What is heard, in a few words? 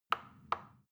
plastic button tiny click soft slow